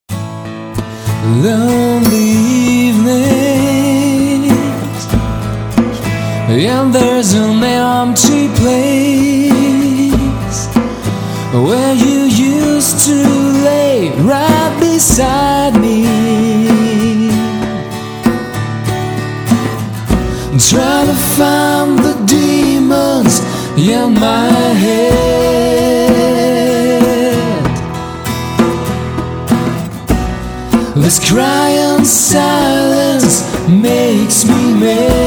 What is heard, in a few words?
break acoustic